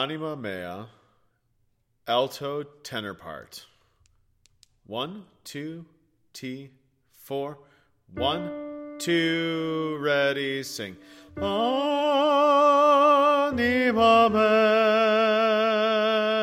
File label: Alto and Tenor